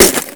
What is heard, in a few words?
bullet glass, weapons impact; Call of Duty Modern Warfare